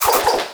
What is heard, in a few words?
SWIPE Whoosh Double; GameBurp; 2000 Game SFX